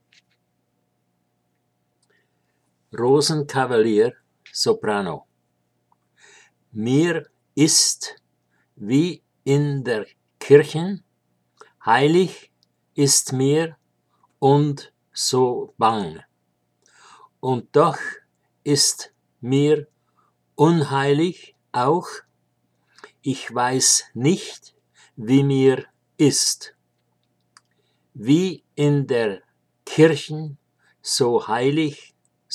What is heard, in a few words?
Soprano; Rosenkavalier; Hab' mir's gelobt (Strauss)